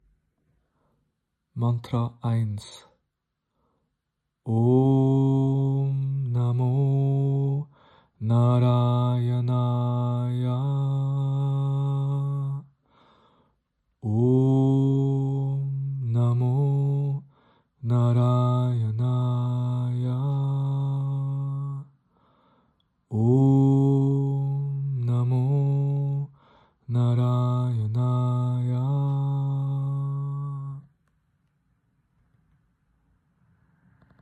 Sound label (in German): Mantra Narayana